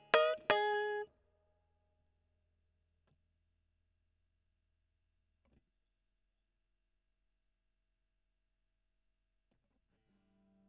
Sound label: E. GTR Gefell OD